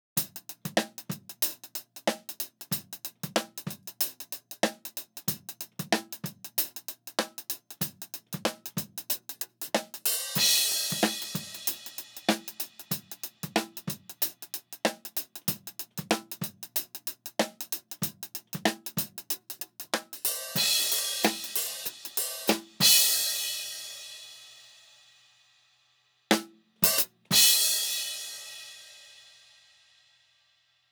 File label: Hat